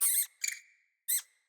Rat Idle; Ambient; Vocals; Verminia Deco Rat Idle B Variant Two C; Animals

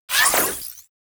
Blastor Pistol Charge

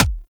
Weapon Punch Rifle; Warframe; Weapons; Rifles

